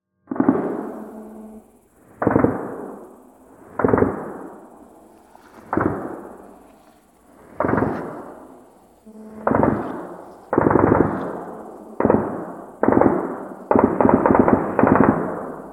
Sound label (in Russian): стрельба